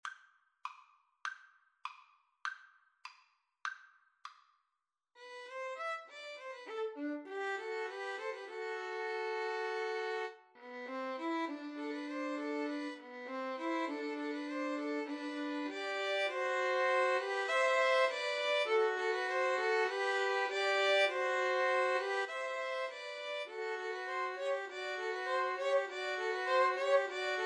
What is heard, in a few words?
pastoral, 2 Violins Cello; Beethoven, Ludwig van - Theme from Symphony no.6 Pastoral